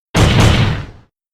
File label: double slam; athena